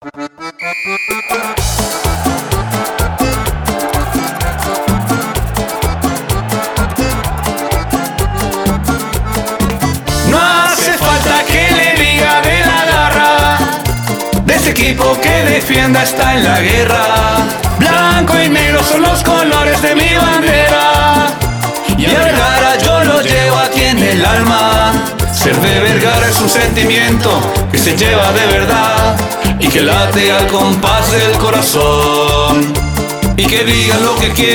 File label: jingle